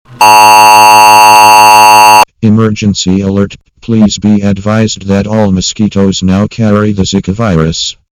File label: zika Alert